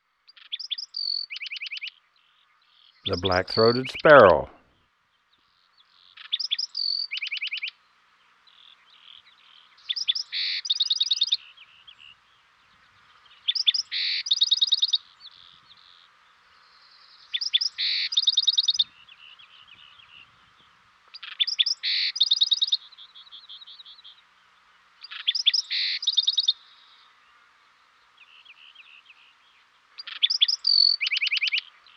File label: Black-Throated Sparrow; Western North America; Dan Gibson; Wildlife Identification By Sound